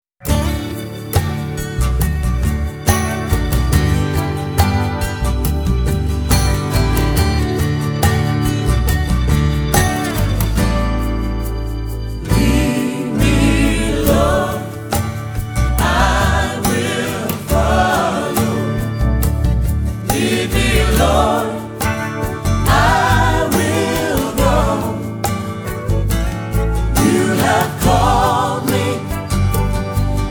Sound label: acoustic worship